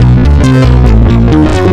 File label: bass 138 d, bass-licks, Techno; instrument-loops